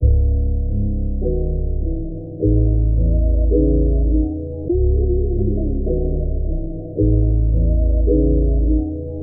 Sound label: piano sludged, Sad; Loops